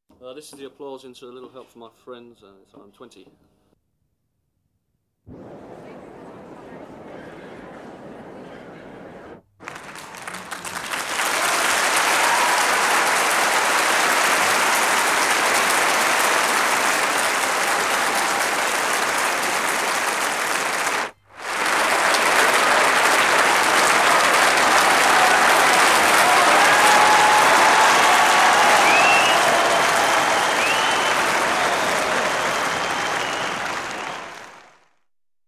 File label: Applause